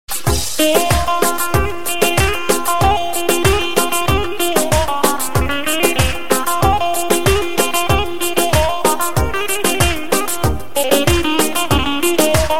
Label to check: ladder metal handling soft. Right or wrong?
Wrong